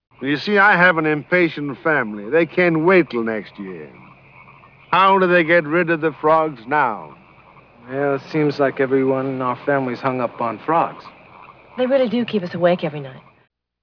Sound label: frogs